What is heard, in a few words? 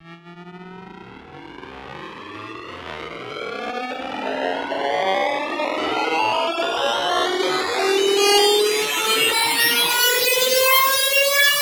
Riser